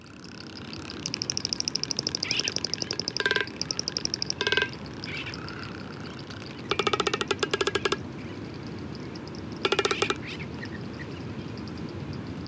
frigatebird

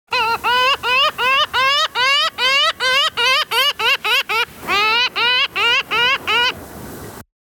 pomarine jaeger call

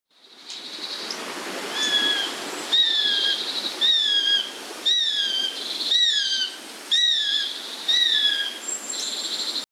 eurasian sparrowhawk cry

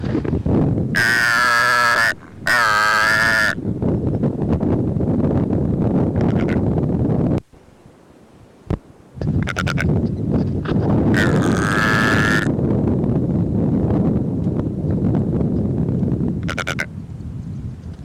secretarybird call

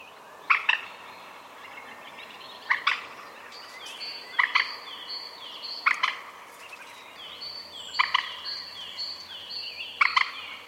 common moorhen call

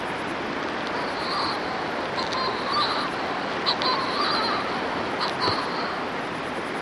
manx shearwater call

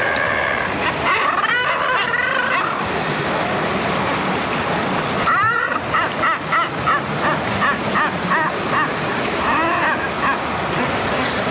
commom murre call

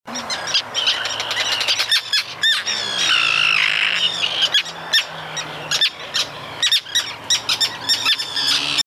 common tern call

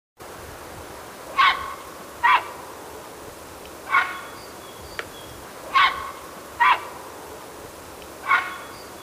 grey heron call

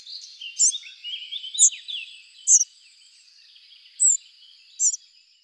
spotted flycatcher call

